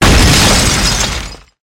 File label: explosion; iceman